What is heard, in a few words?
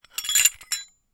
Hole Saw Rattle, Hole Saw Bits